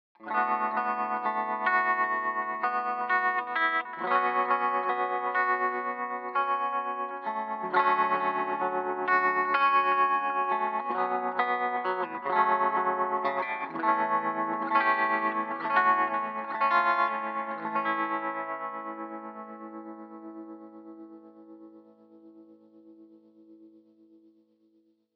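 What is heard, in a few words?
clean, guitar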